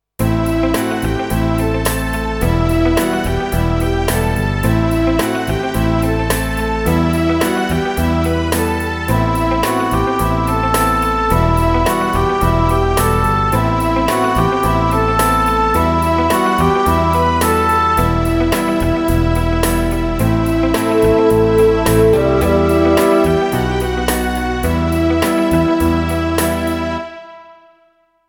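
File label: Em Riff pop ballad english horn 108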